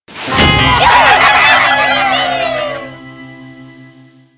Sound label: level clear military